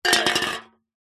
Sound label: foley drink can empty drop or knock over on concrete; zapsplat